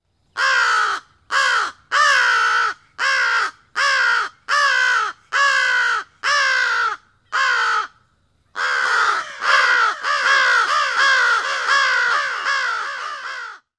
caws

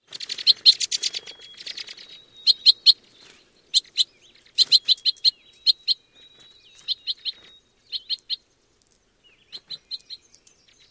calls

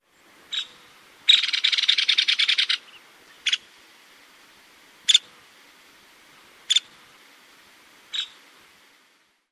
calls

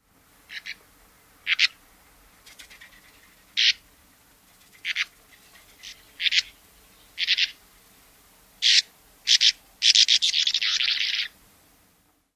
calls

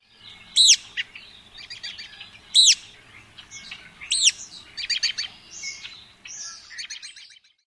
zeeeup flight note

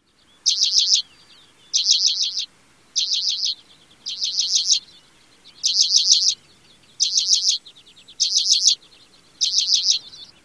chit chit chit calls

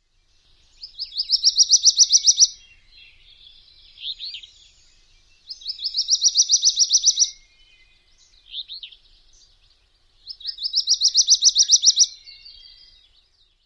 songs

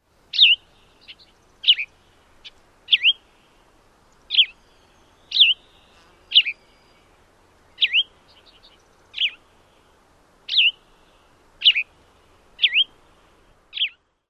song

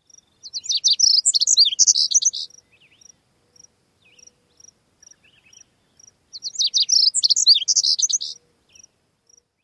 song

